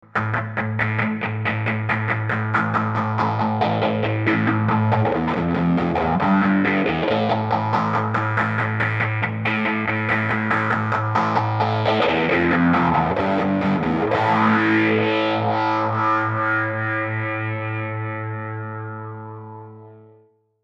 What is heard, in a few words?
overphase; effectrode